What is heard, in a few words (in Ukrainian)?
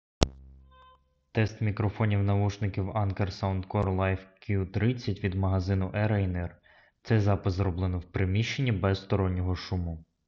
в приміщенні; Anker SoundCore Life Q30